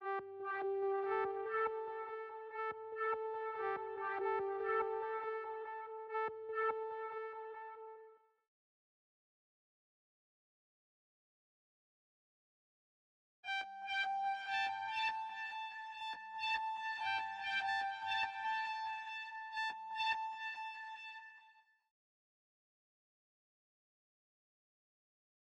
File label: 143 BPM G Min Texture; Cymatics; Rise Up; Destiny Gold Expansion